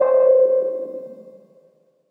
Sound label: ARP2600 PLUCK Beacon C; Synth; One Shots; Cymatics; Fantasy Synth Sample Pack